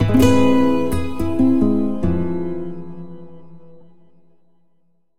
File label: level lost; 摇钱树